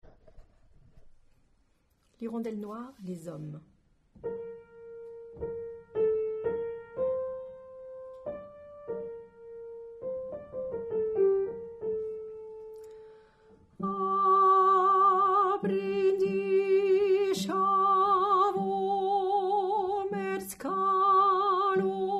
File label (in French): hirondelle Hommes